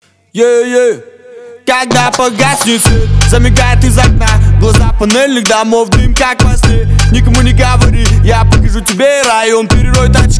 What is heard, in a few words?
brazilian funk